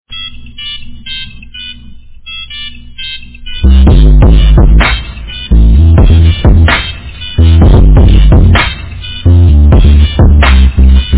nokia kick remix

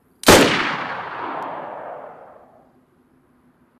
single mosin gun; dayz

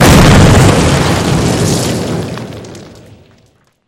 explosion stone

